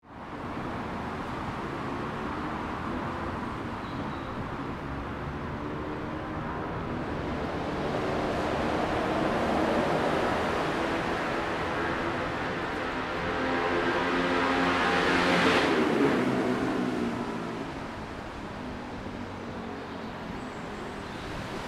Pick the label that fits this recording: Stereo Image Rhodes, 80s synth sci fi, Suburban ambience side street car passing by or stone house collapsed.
Suburban ambience side street car passing by